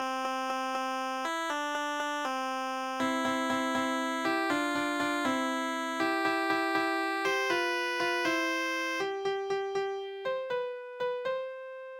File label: canon, midi